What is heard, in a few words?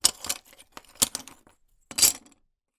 foley tile broken peices movement on table